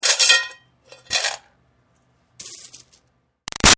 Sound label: Foley Coins Moves Metal Bucket; Money; Objects; Big Room Sound